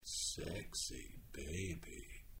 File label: Baby